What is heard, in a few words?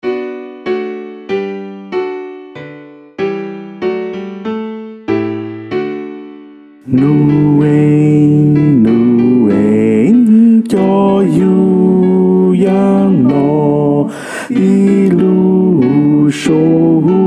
bass